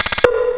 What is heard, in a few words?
RATCH POP